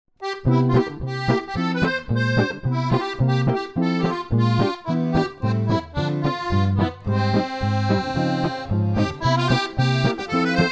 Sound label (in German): Akkordeon Git